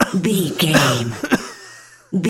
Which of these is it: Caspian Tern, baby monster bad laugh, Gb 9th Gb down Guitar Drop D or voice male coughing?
voice male coughing